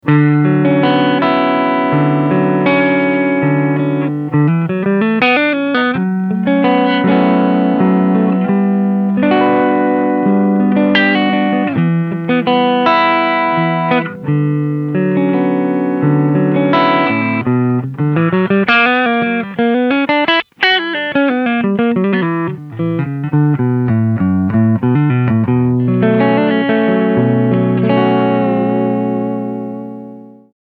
Channel A Full Gain

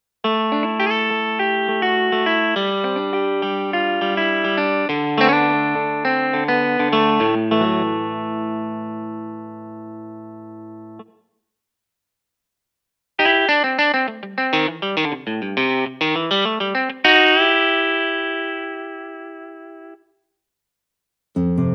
Guitars

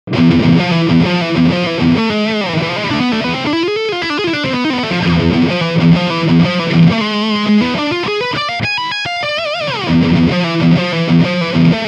Leadchannel

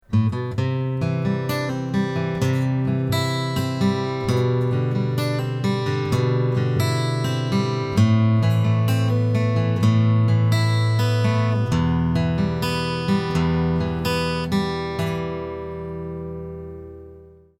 Picking

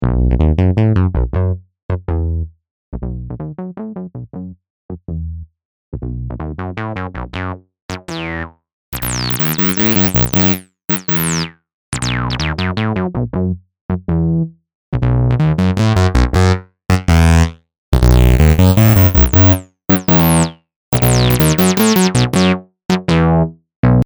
Vacuum Rotten

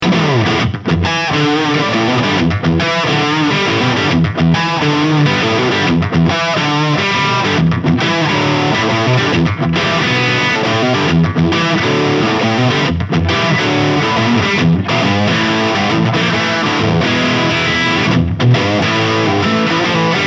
Harley Benton Mighty 15TH Crunch Humbucker

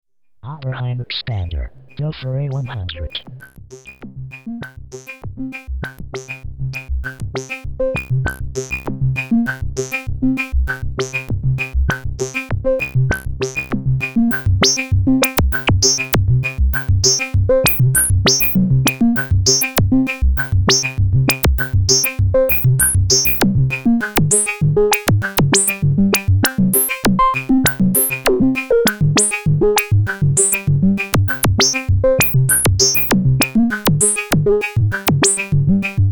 A106 2 Pole Low Pass Xpander Reso

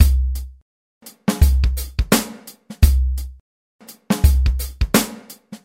Boom Bap Intro; Zoom R20

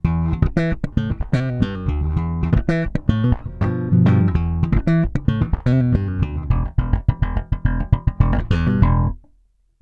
Neck middle series

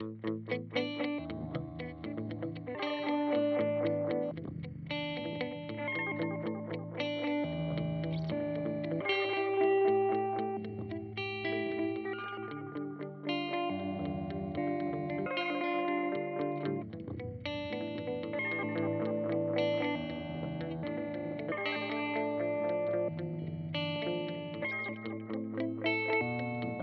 Memory Boy Modulation

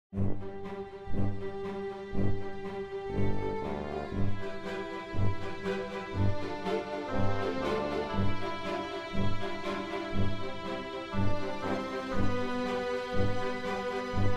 hyper orch seq

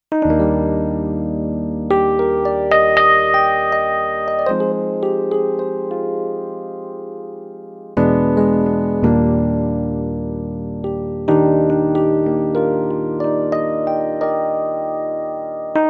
Yamaha NP 35 E Piano

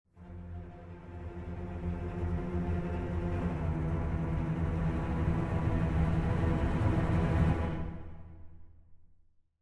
Orch Ens Tremolo; Nucleus

